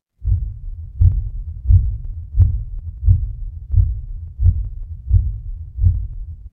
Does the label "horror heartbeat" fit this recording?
Yes